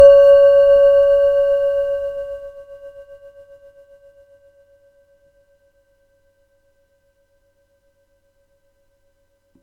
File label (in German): tib om, Planetenschalen